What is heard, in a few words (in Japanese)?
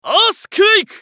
ウィザード男 アースクエイク